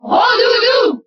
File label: Cheer French; Jigglypuff; SSBB